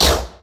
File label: imp shoot